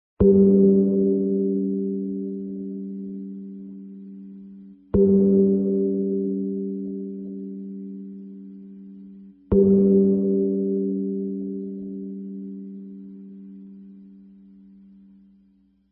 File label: Hoi Chuong Awakening Call